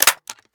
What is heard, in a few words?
magin, m16a4